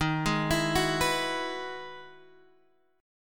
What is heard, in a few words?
E Major 9th Eb, chord